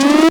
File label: Synth Sound; Vengeance Samples; VEE Synth Shots